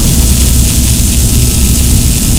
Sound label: laser bore loop; Tools; Barotrauma; EK modern